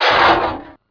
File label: Metal Impact; knights try